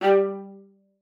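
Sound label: G3, viola spiccato; Xsample VOL-5; 90 s Sample CDs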